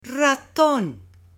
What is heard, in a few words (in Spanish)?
RATON, ANIMALES; AppLenguaje